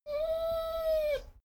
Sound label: dogo argentino whine complain; natur-tiere; hunde